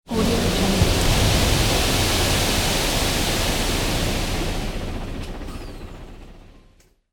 truck dumping sand

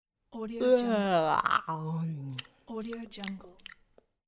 female sigh and yawns

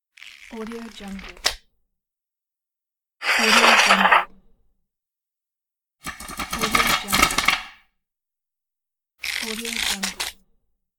rustling book pages; livingroomclassic

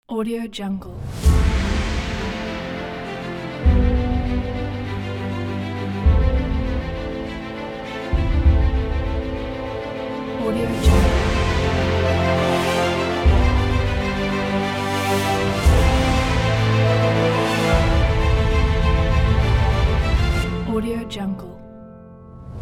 epic inspire adventure

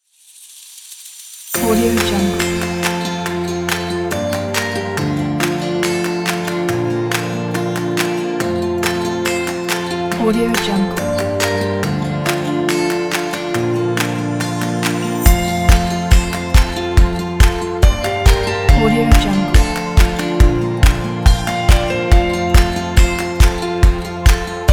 upbeat indie folk

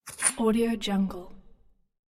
glass impact bottle stopper hit; unfeasible

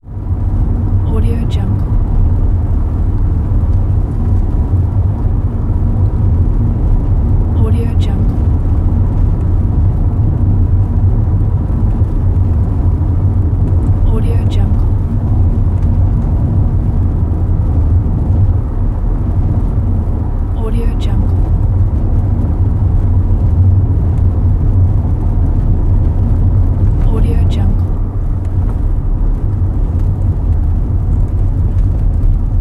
car driving; soundsground

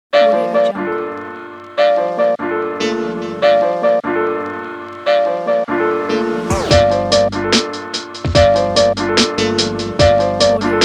hip hop stylish beat; nikita-kit

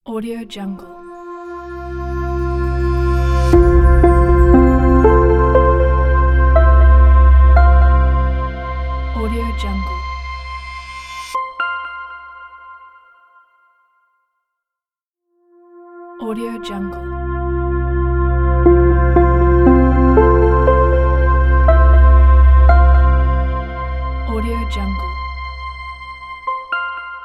for logo

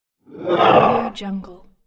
zombie

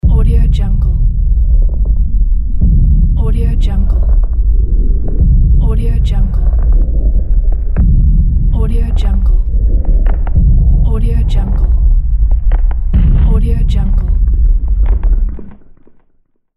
trex stomping

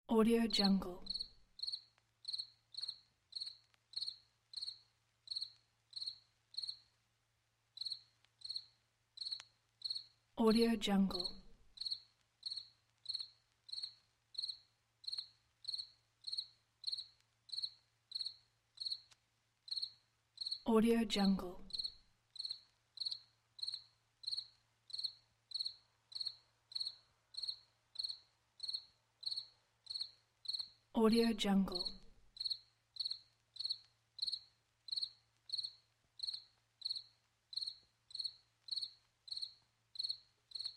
cricket

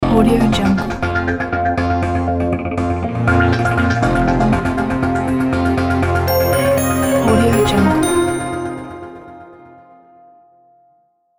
moving suspense logo; zilosproductions

